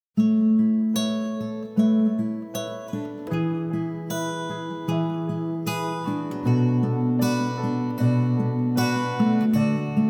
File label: Instrumental